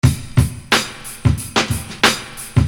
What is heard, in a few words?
Beat, Hip-Hop Street Beats